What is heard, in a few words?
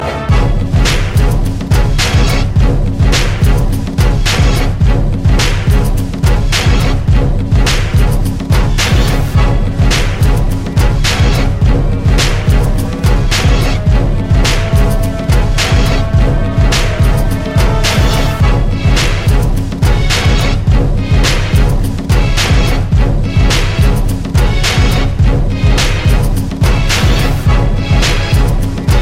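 anthem instrumental; Los Angeles Lakers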